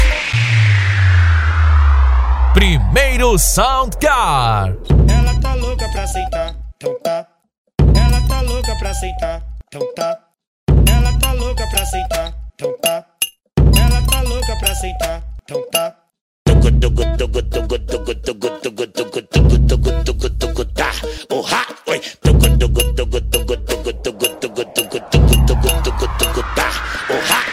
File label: sound car